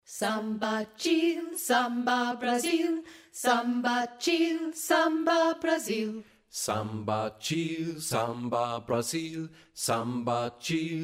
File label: Samba Chill